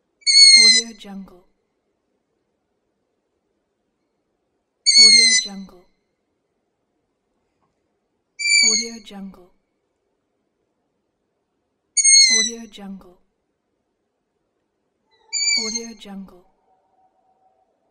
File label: hawk cry